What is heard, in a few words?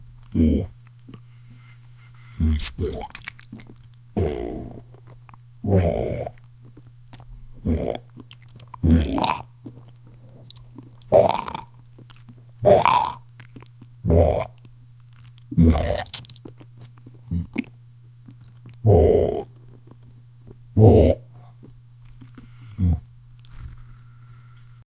a man slowly swallows